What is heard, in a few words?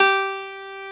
Piano C#2